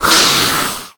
attack screech, headcrab black; creatures; vox

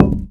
concrete impact soft; physics

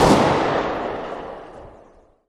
galil distant, weapons; galilar; Counter Strike Model Pack; CS:GO

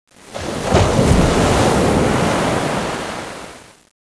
wave, water; Half Life Model Pack; Half Life 2

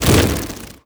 buzz, antlion wings; creatures

